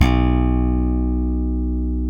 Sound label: E Bass Slap